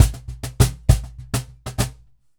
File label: Cajon Loop 100BPM; musicradar